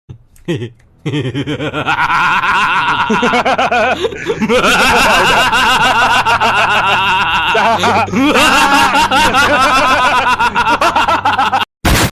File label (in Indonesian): ketawa jahat